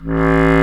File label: WND CB.CLA; Roland - LA Composer vol. 1